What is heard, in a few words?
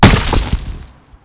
bush; Mafie 1